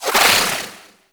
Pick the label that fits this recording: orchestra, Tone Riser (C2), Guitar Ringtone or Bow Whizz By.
Bow Whizz By